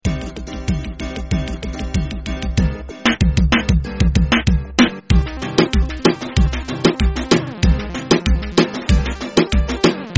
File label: Reggaeton